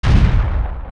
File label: Stomp; King Dodongo